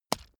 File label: leather impact mud hard